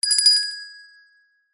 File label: bell small hand ring short